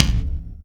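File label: bass drum, left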